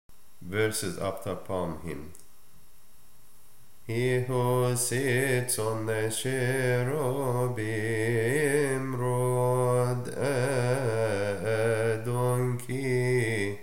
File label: After Palm hymn; Verses after Palm Hymn